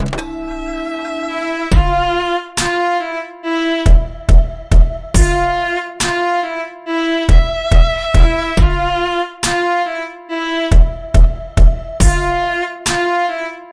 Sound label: Techno Symphony